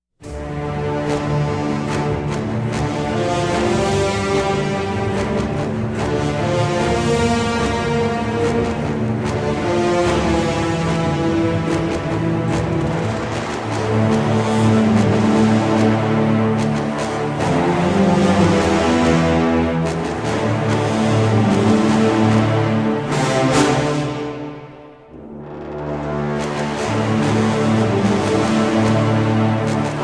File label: Orchestral